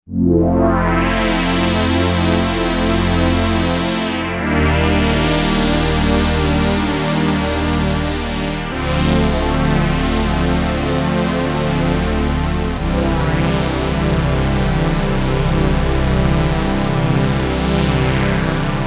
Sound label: obx8; wavering bloom